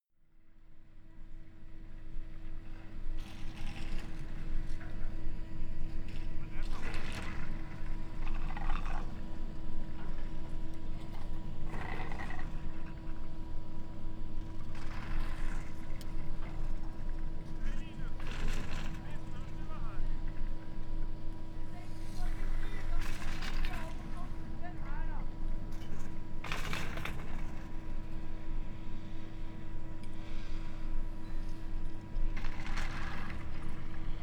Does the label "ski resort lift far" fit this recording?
Yes